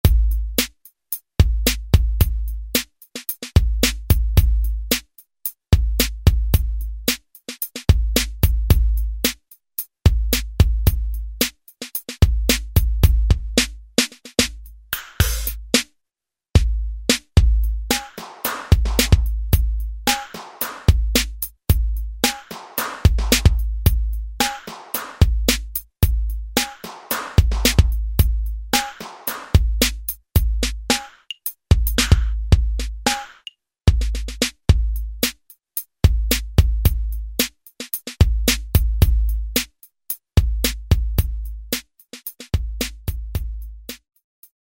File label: Rap, Alesis SR-16 Preset Patterns